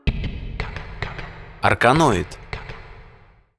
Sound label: Chat LOL; ARCANOID